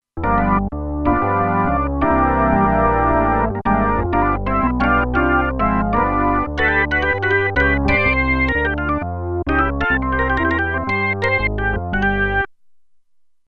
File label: Organ